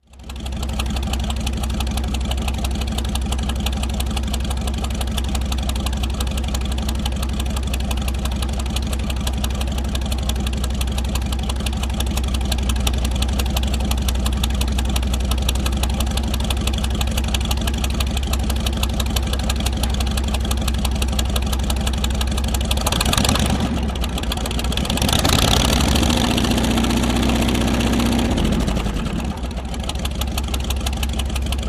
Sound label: Military Vehicle Engi